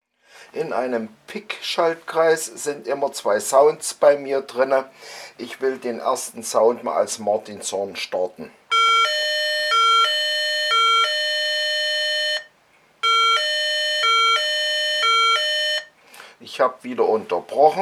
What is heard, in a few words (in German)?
Martinshorn Internationale